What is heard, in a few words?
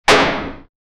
low bitrate metallic clan